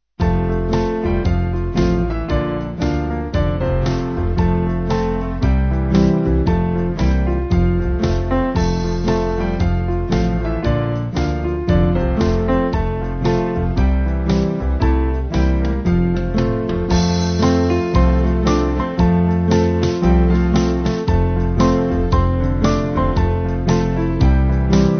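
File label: Band, Music Backing; There's Not A Friend; Hugg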